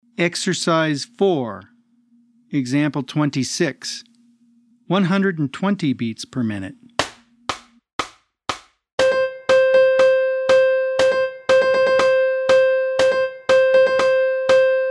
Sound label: 120 BPM